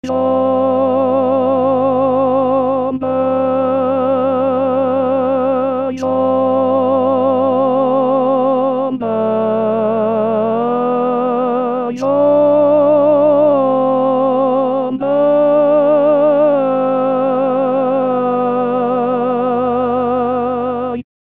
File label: Lead, Barbershop